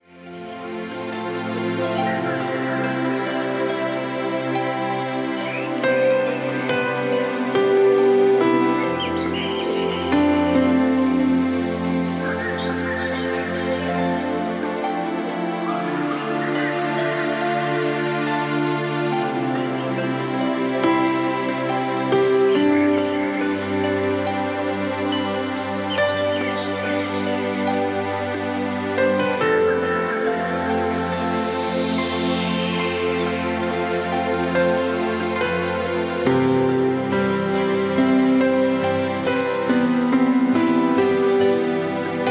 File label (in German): Entspannungsmusik mit Klavier